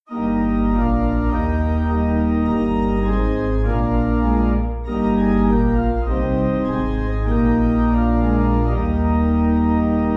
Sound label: Pipe